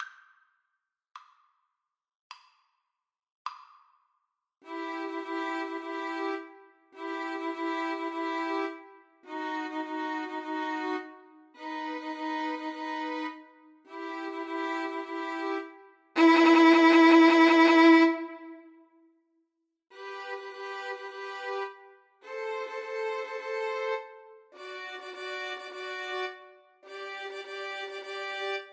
four seasons VLN TRIO

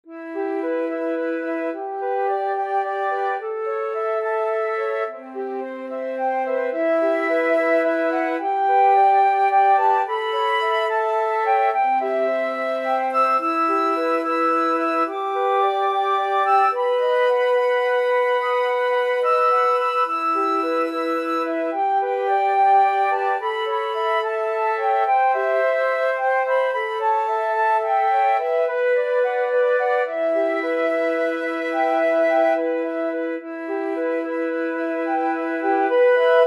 house of the rising sun, flute quartet

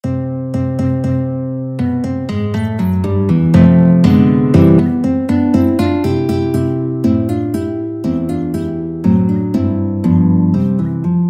Contradanzas, guitar

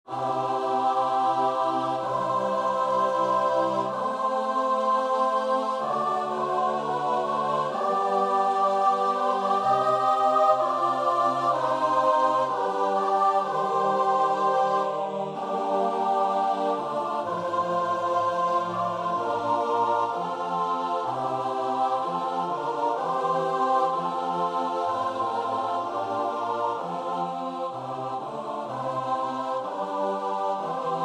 choir; mayr havado

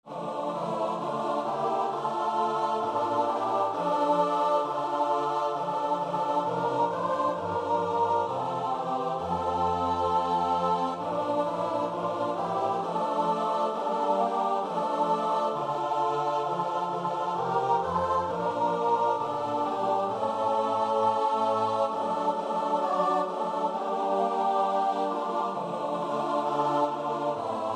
choir; let all mortal flesh

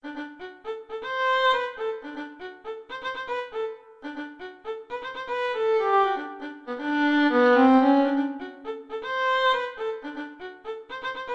Funk Study, violin

